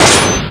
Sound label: lock slam; trilogy